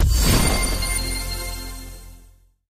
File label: gamble five; jacks or better multiple hand